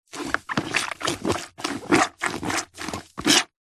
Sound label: household cleaning mop in bucket little water squelch; zapsplat